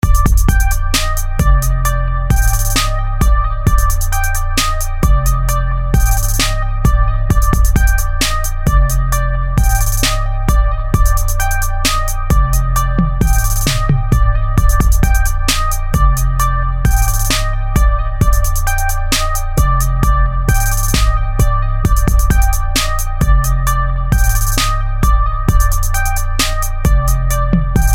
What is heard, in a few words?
TRAP BEAT HIP HOP